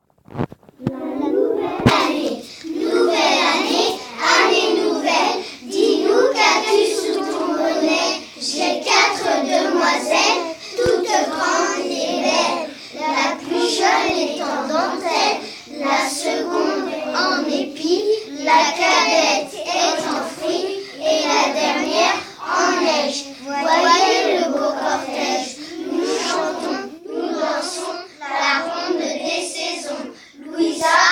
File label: Vocal